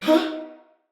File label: Stun, Male, en; Battle; Barks; Dialog; Sinecure Banneret